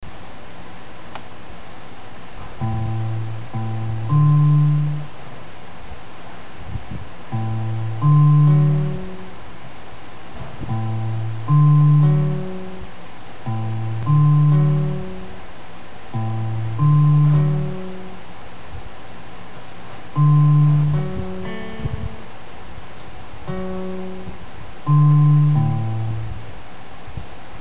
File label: Taps